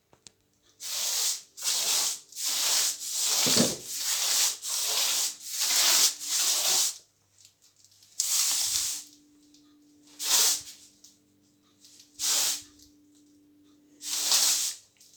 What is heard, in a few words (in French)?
Frottements papier caisse claire balais; Percu Bois